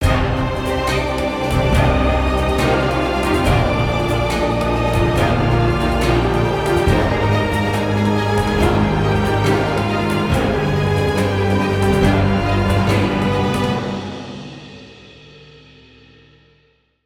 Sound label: Battle Drums; Necropolis